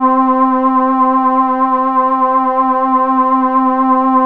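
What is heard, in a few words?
choir C4, Oberheim OB-Xa Pads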